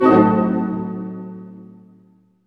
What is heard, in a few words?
HIT, Dynamic Orch, Tutti Hits; Roland LCDP08 Symphony Orchestra